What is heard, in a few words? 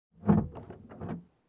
Opening Trunk